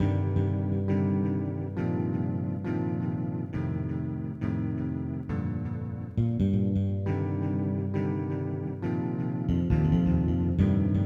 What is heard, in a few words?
Listless Guitar